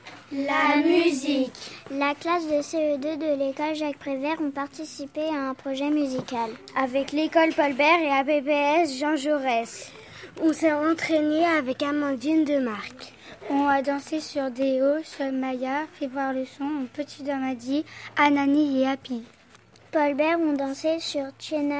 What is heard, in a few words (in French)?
projet musique